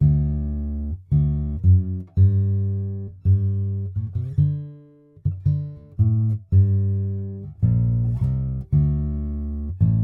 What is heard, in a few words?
solo